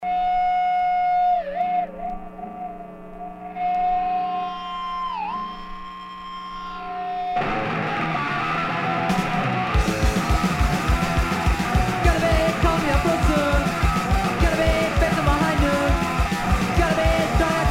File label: draft song